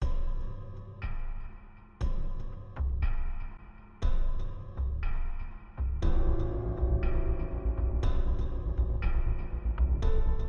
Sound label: Intro Background Musical